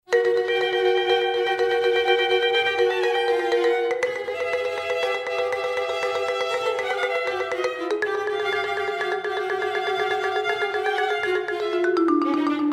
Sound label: Marimba Vibe; Kammerensemble Neue Musik Berlin conducted by Roland Kluttig